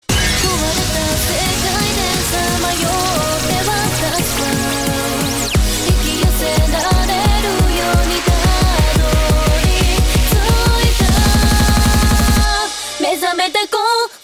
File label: Drum'n'bass